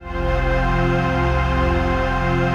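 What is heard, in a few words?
trance pad; Best Service; XX Large - Pads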